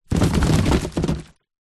foley presents fall over many; zapsplat

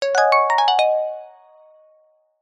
multimedia alert notification glassy plucked zen

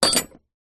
industrial metal hacksaw junior down on surface

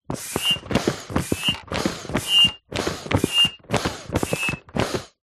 industrial foot pump plastic pumping whistle air

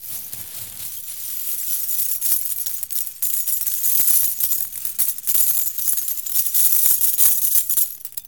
Coins Pouring; little robot sound factory

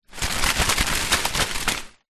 bush leaves branches shake

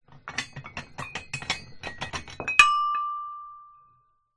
Weights

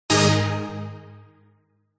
game sound big arcade retro notification event

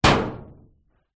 impact metal door screwdriver; zapsplat

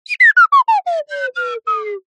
cartoon slide whistle descend stutter slow

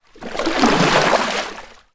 transport boat paddle water single

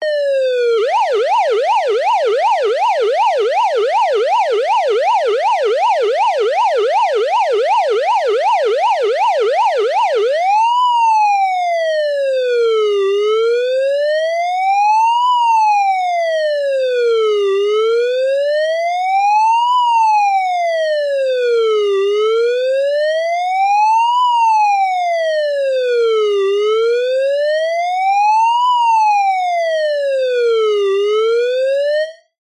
emergency police siren isolated clean; zapsplat

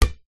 ball netball single cheap bounce court; sport

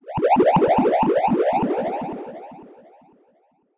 sound design warble stutter; zapsplat

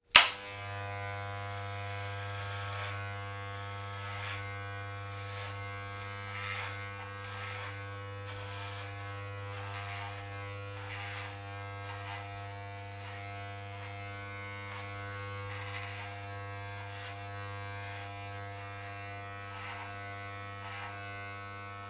hair clipper on shave head grade 2 close up off

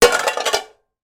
impact pots pans crash; sound effects one

